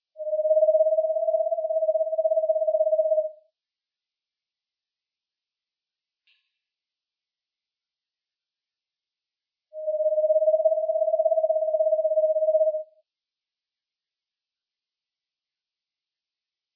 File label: screech Owl